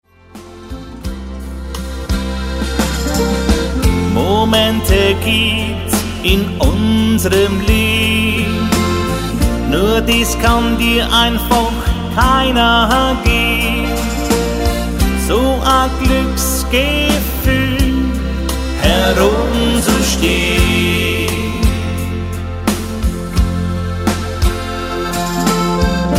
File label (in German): Dann schallt der Jodler